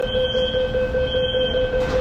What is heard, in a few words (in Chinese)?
地铁关门警示音